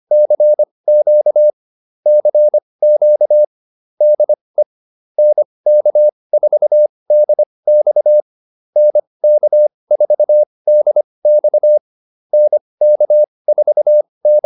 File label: QSO, intermediate practice files